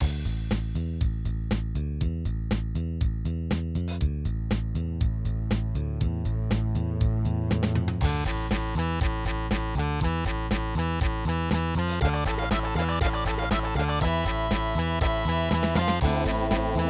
SC-55 GM test MSSynth, MIDI that uses only GM instruments